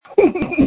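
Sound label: screams that feature laughter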